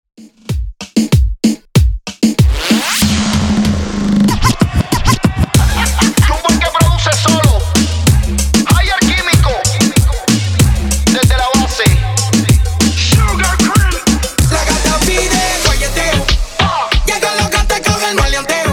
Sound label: Remix Intro Outro 95 Bpm